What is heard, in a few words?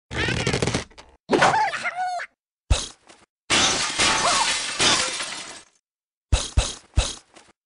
Sms Blue Bird